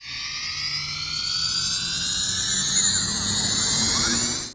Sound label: Energy Charge; Sett; Playable Tutorial Level